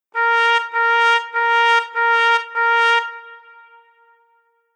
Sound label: Horn Velo 40